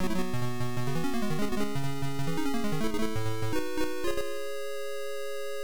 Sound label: Stage Clear, psg; puyo puyo msx2 psg